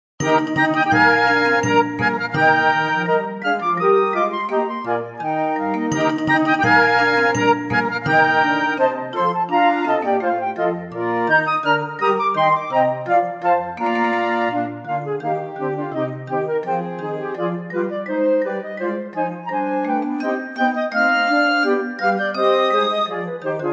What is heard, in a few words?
Gavotte I und II; Drei Bach Sätze